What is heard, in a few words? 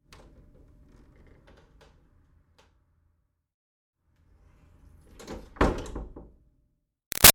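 Door Wood Office Push Med Open-Close; Miscellaneous Doors; BigRoomSound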